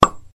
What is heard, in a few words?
ping; waze